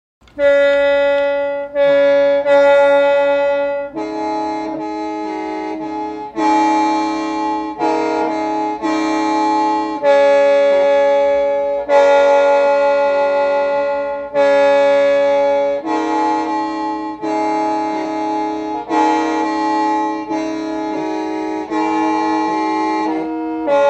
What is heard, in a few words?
example few notes, qeej